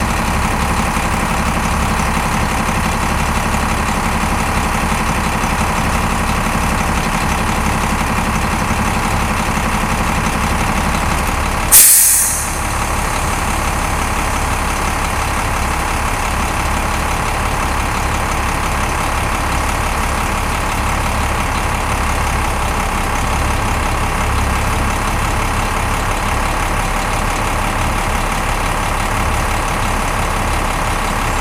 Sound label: Bus idle Pullaway Brake